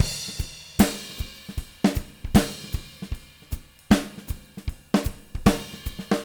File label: 154 CRSH, NTPASS, Living Drums; Sampleheads; Peter Erskine